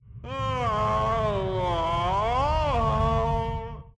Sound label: groan; Voices